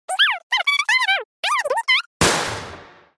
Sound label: powerful spell